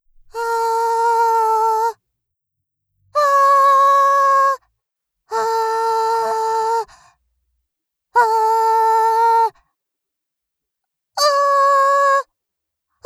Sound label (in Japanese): あーーーーーーー